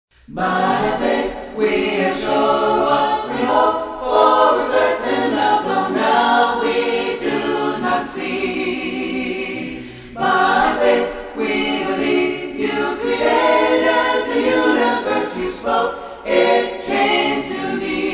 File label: VOICES ONLY